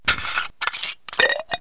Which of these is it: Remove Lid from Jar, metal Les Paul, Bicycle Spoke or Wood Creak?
Remove Lid from Jar